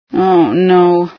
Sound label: startle, female